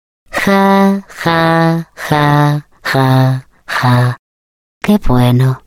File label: taunt laugh, es; GLaDOS